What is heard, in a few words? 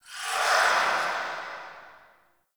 Cavernous Water Lap Rock Distant; Ambience; Dungeons; Under City